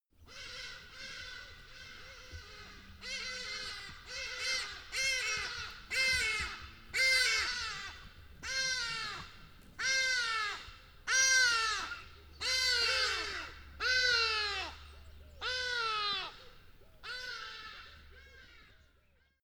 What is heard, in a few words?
birds call pass by